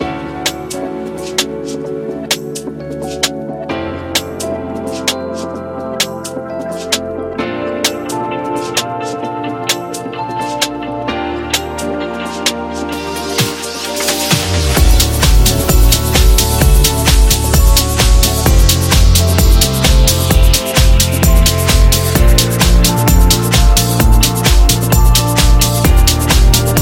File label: Beauty Abstract